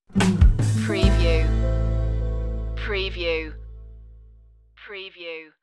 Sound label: natural stinger